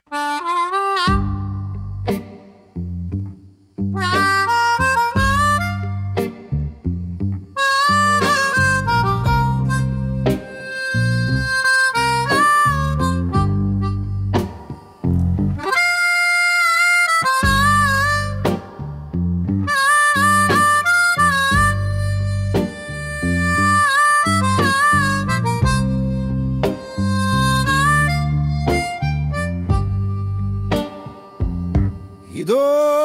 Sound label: ukrainian beat